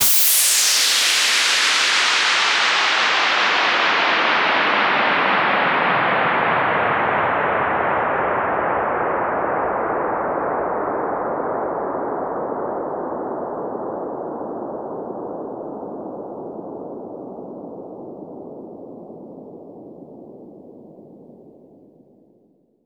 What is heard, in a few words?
FX Sweep Down; vengeance essential club sounds-3